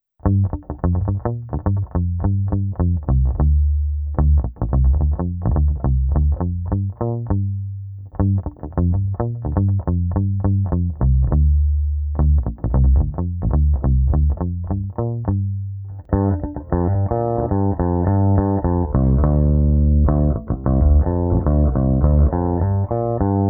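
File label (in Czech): Kobylkový snímač s úplně staženou tónovkou, Jazz Bass; Sadowsky NYC Ultra Vintage J Bass Guitar; Hráno tedy kompletně trsátkem s tlumením a pak bez něj. Použil jsem kompletní zvukový řetězec s kompresorem a simulací aparátu.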